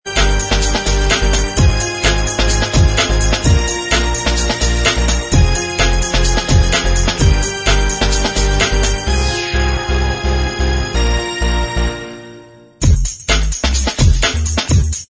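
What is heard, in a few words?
instrumental track, backing tracks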